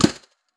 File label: Steel Brush Flam, Snares; Ghosthack - Free Snares 2023